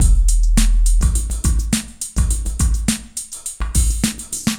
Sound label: HUM DRUM 104, Dance Trax loops; SILLY CONE 2